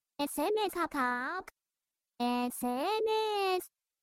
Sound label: SMS; Hatsune Miku